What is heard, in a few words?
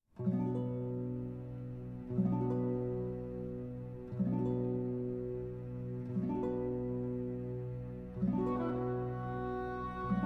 Concierto de Aranjuez for Guitar and Orchestra, Adagio; Rodrigo